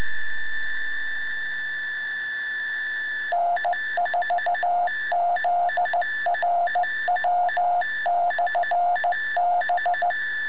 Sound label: FSK Beacon